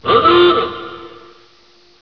KAHOOGA

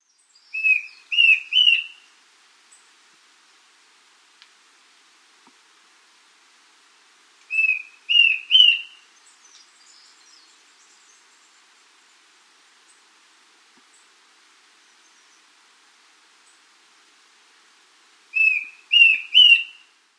Cundinamarca Antpitta, Nature's Songs, S. America

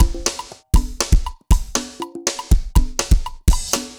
dancefloor perc 120 bpm; loops

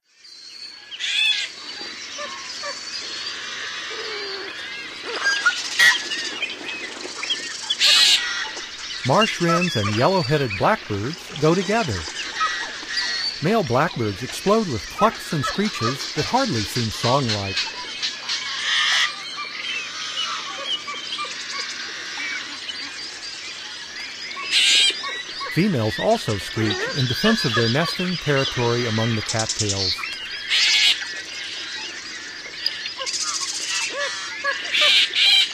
Yellow-headed Blackbird; North America; Common Western Species; Know Your Bird Sounds

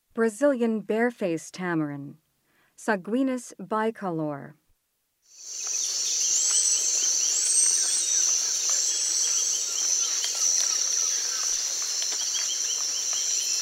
Brazilian bare-faced tamarin; S. America; Rainforest

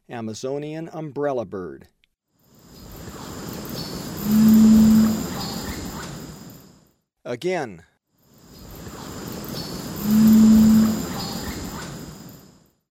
Amazonian Umbrellabird; S. America; Eastern Lowlands

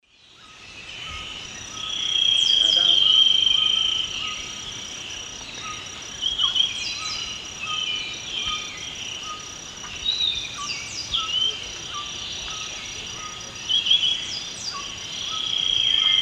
Philydor erythropterum, song; S. America; Eastern Lowlands

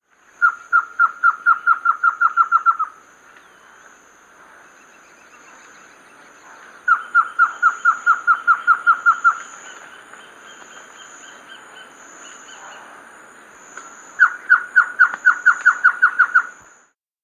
Black Antshrike, Nature's Songs, S. America

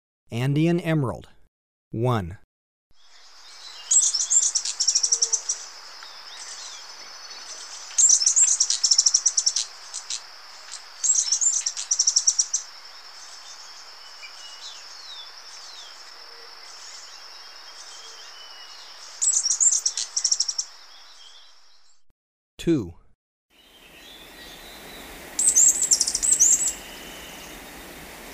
Andean Emerald, Birds of Ecuador; S. America

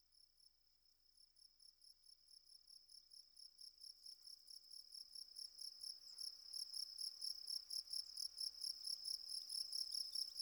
Goldfinches & crickets

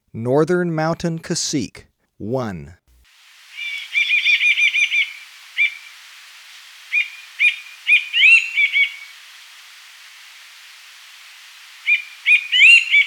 Northern Mountain-Cacique, Birds of Ecuador; S. America

